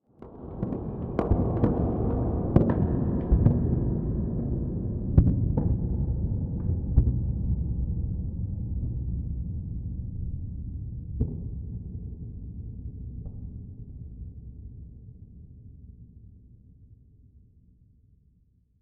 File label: Firework Ambience Bonfire Night; Firework Ambience - Guy Fawkes - Bonfire Night; Firework Sound Effects; explosion sound effect